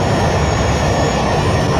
Plane Jet Loop; Airplanes

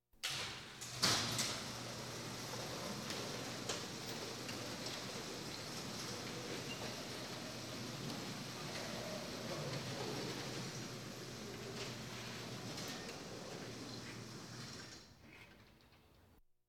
Police, Fire engines

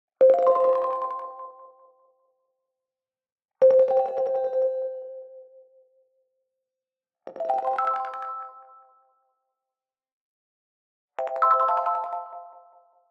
Arcade Click Positive

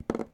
Tap Fingernails On Desk; SFX Kit; Foley - Misc

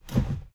driver foley wheel turn; Blind Drive

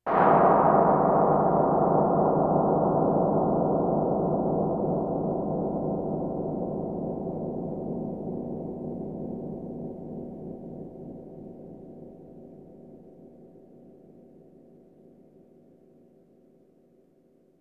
Percussion Bongos Tympani rolls Gongs Drums

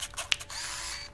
Camera Take Picture; SFX Kit; Foley - Misc

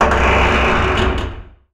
Hatch Large Metal Open; Doors

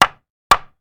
Nail Hammer into Wood; Tools; 1001 Sound Effects

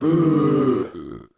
Crowd Booing; Pitch Black Audio Pong

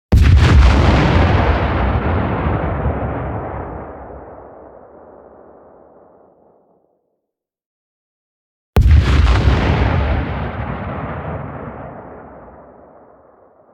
EXPLOSION LONG Super Massive; boom library; Anime Essentials

